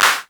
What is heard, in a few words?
Clap Soft